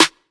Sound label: SNARE ROLL PATTERN